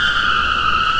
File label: skidding; poly track